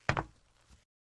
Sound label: Metal Runners Left, footsteps metal